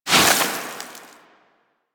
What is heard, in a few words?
Sword Elemental Ice Whoosh; Enemies; Weapons; Warframe; Teshin